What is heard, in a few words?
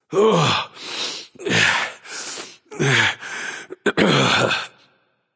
nv breath; smite; voicepack; xbalanque